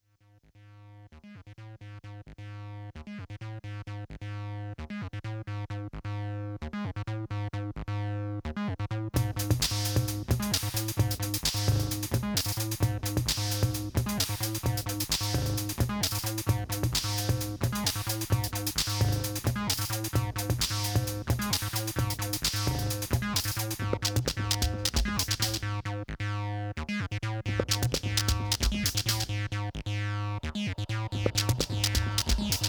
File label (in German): TD-3 ohne Update